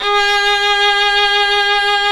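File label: solo violin, Synclavier Strings; ILIO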